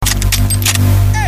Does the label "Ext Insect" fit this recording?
No